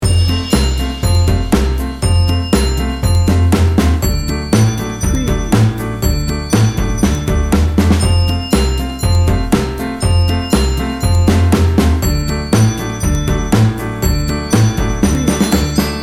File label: funny business loop